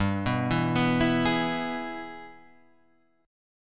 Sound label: Ab Minor Major 7th Sharp 5th chord